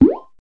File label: Poot; Learn to Fly 2